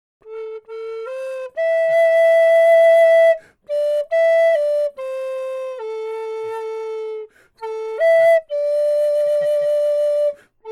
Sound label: Wayfaring Stranger Flute Solo; 2025 Native Rhythms Festival Paula Ellis Memoria